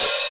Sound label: clean open high hat sample e key, Royality free open hi hat sample tuned to the E note